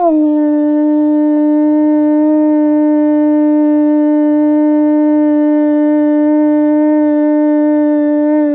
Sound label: horn; gondor